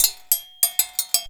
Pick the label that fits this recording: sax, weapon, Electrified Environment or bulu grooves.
bulu grooves